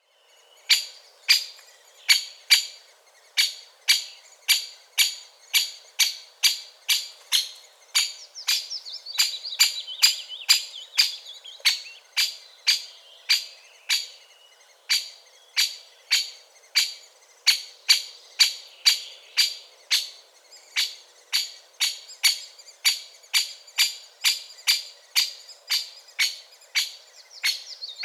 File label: Great Spotted Woodpecker Dendrocopos major